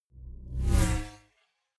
sci-fi vehicle drive by buzz fast; raumschiff

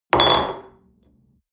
espresso upset table hard, restaurant cafe

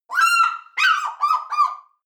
eagle african fish call; natur tiere; voegel

